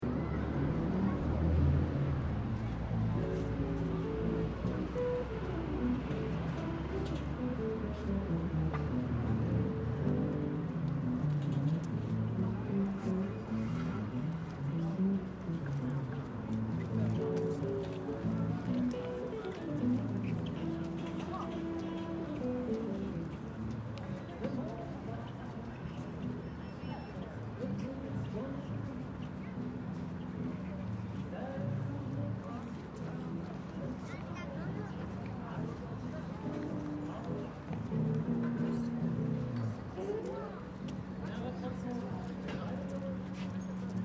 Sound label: City Square Summer Day, Footsteps and voices on light crowded city square. Medium distant traffic; band playing blues-rock in background